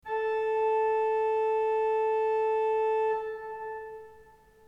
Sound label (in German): ton, orgel